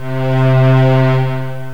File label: slow strings; ensemble